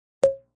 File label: Knock; Knocks